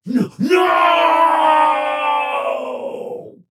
Falling; Paladins; Khan

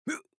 Grunt Attack; Paladins; Lex

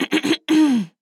clear throat; Princess

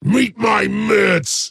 attack pain; Axe

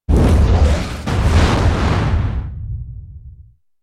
Nether Blast; dota2; Pugna

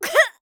Death Grunt; Maeve; paladins

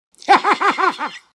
alch laugh; Dota 2; alchemist

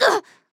grunt hit; Paladins; Assassin

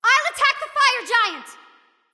Self Attack F Light; Expelled Hel

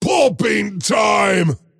attack pain; Dota 2; axe

